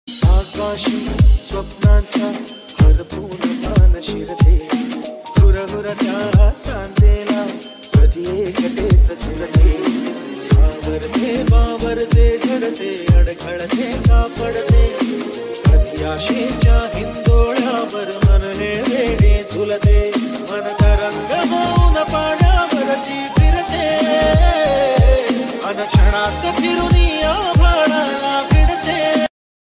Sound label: mobile ringtone; viral love; nature